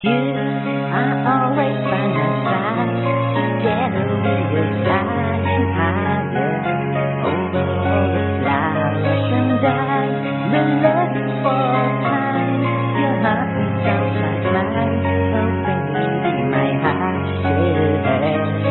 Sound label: female piano, shifting